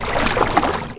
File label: wade